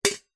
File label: household teapot old metal lid close; zapsplat